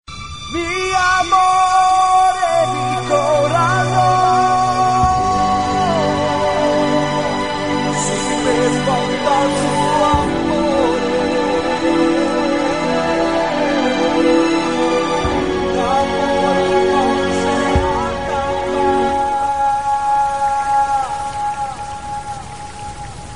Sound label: french style; ringtone